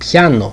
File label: piano